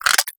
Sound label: egg cracking